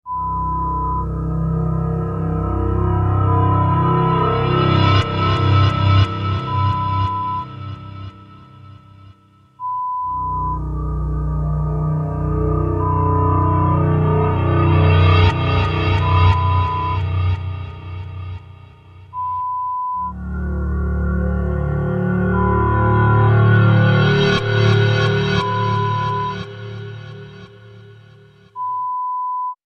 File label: Creepy Atmosphere Horror Sound Effect